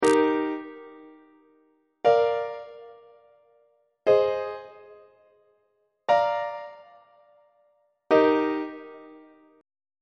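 e flat major inverted imperfect authentic cadence on treble clef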